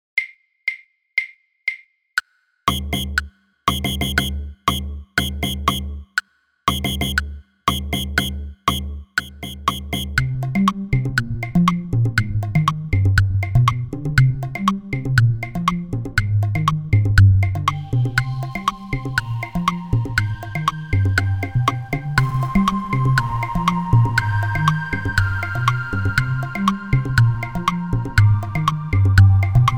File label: synth click 120 bpm; etude no 9